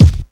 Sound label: Misc Scratch; Mo' Phatt; Digital Sound Factory Mo' Phatt